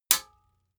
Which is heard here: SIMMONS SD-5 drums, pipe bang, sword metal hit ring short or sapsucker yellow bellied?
sword metal hit ring short